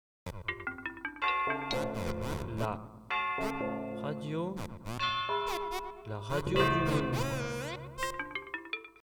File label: jingle